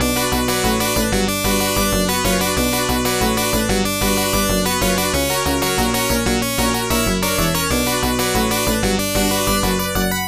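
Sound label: background music; Side Arms Hyper Dyne; Capcom Classics Collection Remixed; PSP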